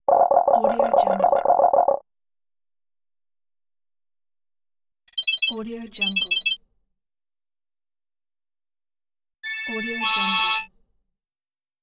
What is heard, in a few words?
ringtones